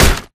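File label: impact bullet light ballistic android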